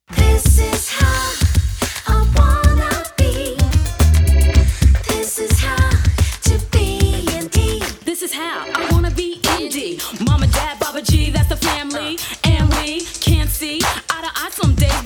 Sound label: Indie Rap